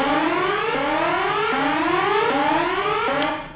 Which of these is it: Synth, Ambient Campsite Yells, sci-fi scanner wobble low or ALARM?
ALARM